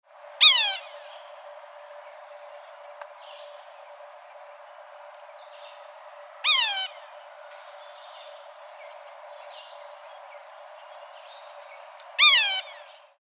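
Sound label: Yellow-bellied Sapsucker Mews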